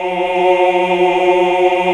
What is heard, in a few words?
OOH, Hallelujah